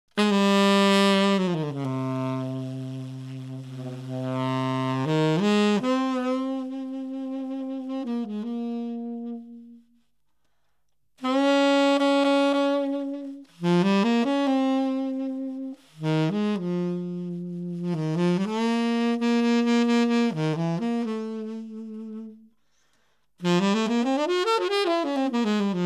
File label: Instrumental, Saxophone